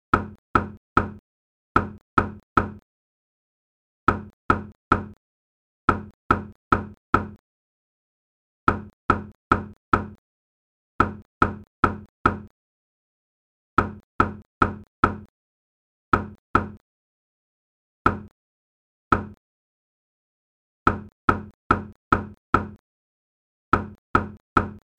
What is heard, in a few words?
Knock Code; Outlaw; The Innocents Online Game